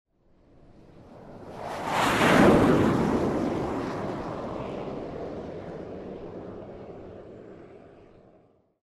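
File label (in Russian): самолёт реактивный истребитель F-18 пролетает мимо быстро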